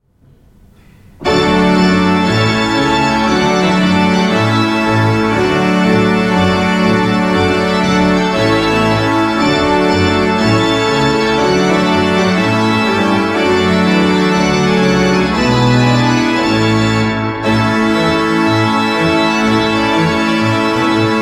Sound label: Trumpet Tune; Trumpet Tune in D major; Purcell, Henry; Bryllupsmusikk